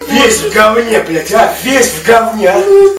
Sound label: fart; pvkii